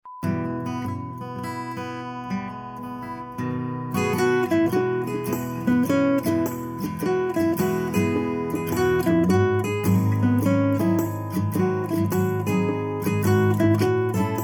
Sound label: music on guitar